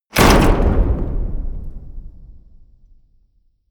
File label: hit wood, hits impacts